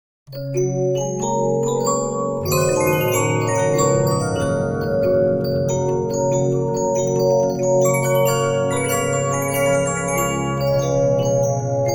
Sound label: Regina, 15inch disc musicbox